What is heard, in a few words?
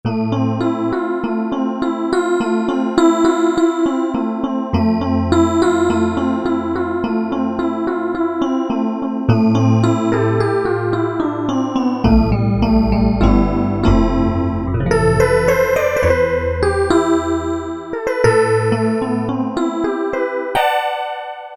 Wavola; Factory Performance Patches; Factory B